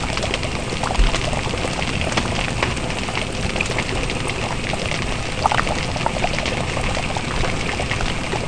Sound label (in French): bouillon; Mobiclic; Jeunoel